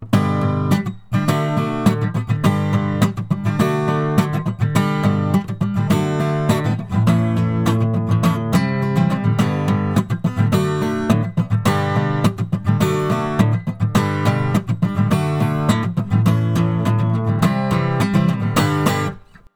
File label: Acoustic compressor reverb